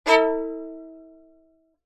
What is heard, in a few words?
violin bowed staccato stab single 2 notes; musical